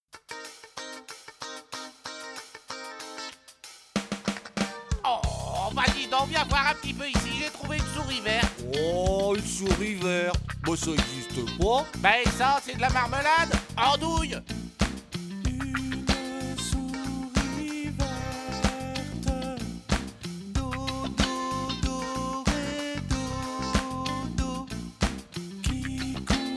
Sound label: GLOCKENSPIEL